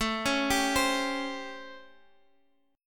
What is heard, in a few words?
A 7th Sharp 9th, Guitar, Half Step Down